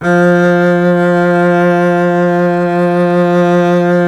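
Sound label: string contrabass F0; Miroslav Vitous - Solo Instruments VOL-2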